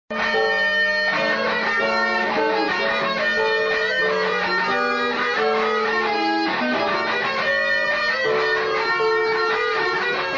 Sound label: guitar practice